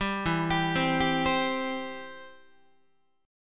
E Minor Sharp 5th, Guitar, 4A,4E,5A,5D,5G sharp,6C sharp; Em#5 arpeggiated; JGuitar